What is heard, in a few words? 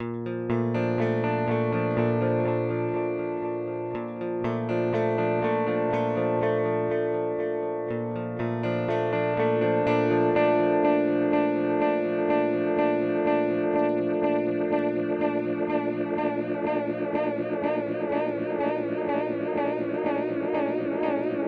Mooer D7 X2 Mod Flanger